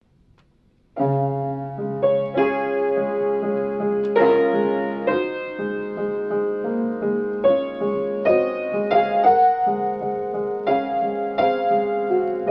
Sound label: Rainfall at Bethlehem, piano solo sacred